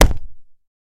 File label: Body Hit Smack; punches kicks hits bones